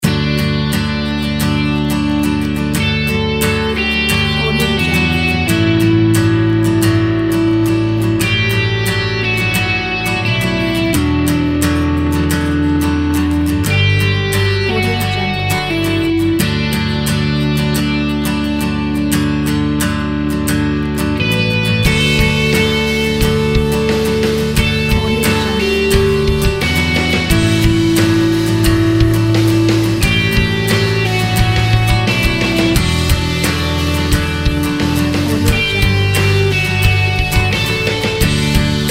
acoustic indie uplifting rock guitars music

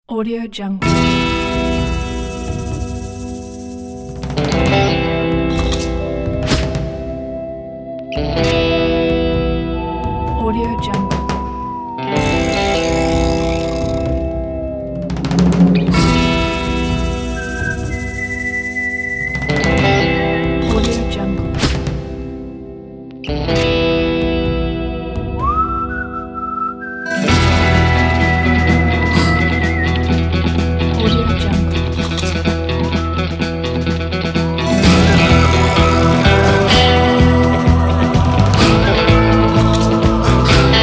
western

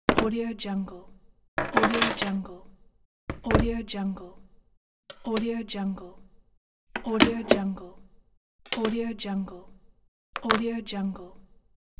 put and take from the table

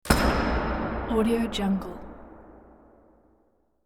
giant echoey door slam; hollywoodedge

